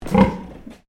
Animal Pig Landrace Inside Grunt

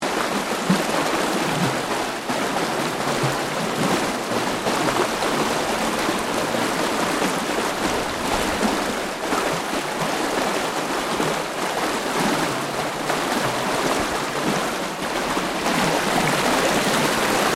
Water Stream Gurgling Loop; Elemental weather effects; Nature

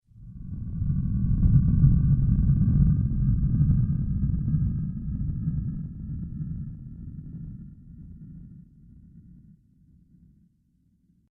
Deep Space Motion, Science Fiction Sound Effects

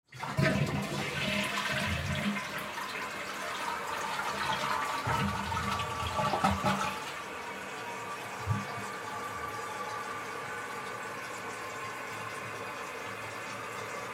Toilet Flush Lid, Toilet Flush Lid Down; Bathroom; Household; Blastwave

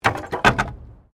Wood Fall

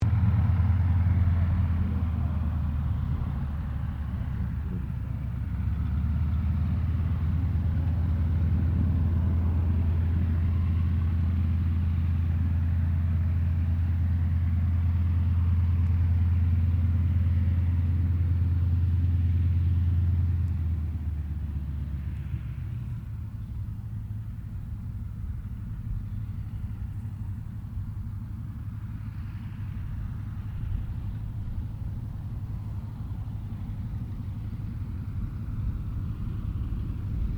AIRCRAFT CAC WIRRAWAY TAXI STEREO; CA-16 Wirraway; Airplane; Airplanes; Vehicles

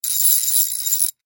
Industry Ratchet Turn; Hand Tools

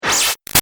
Shot Laser Big; Sound Design; Solid; Lasers; Big Room Sound

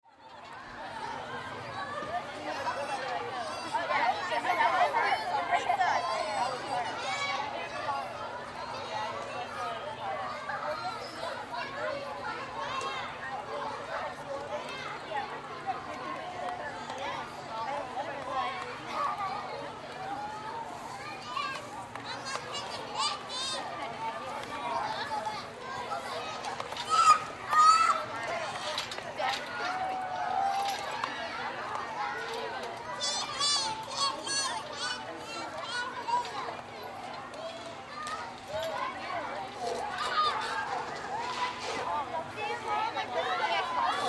Ambience Playground Children Playing; Backgrounds; Playgrounds

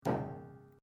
RUBBER ON METAL SMALL; Materials

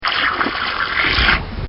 Pour Water In Bucket

